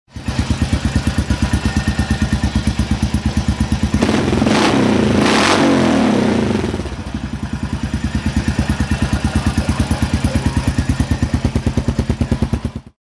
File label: foreman